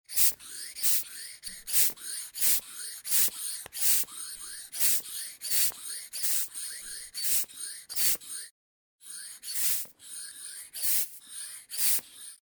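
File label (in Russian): звук раздувания футбола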